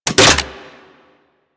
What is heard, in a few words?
Rocket Launcher Reload